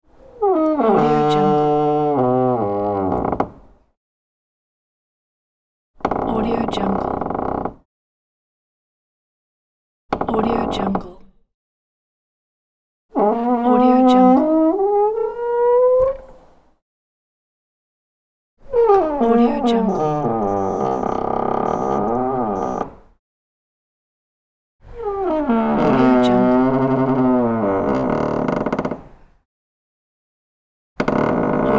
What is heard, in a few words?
door squeaking pack; inspectorj